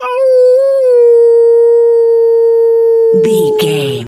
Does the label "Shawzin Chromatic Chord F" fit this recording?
No